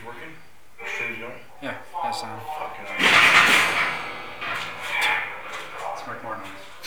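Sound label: bang no response; Old 6th Detroit Precinct; in basement; Spirit Chasers of the Unknown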